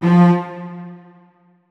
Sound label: notes, keyboard strings; Hideout Furniture